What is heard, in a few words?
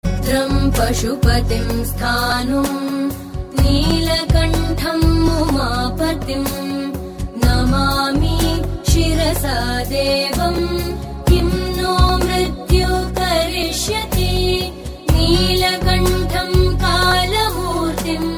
MAHA MRITYUNJAY MANTRA RINGTONE